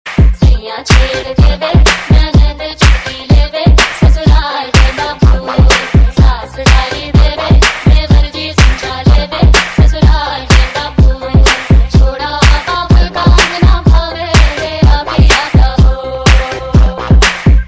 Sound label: Hip Hop